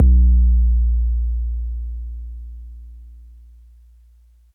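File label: bass drum 808 MPC60 Noise A#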